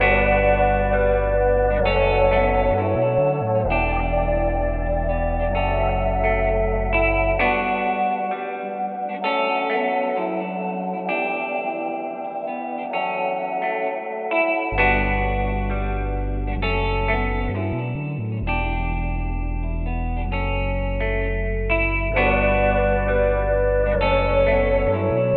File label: guitar voice, Sad; Loops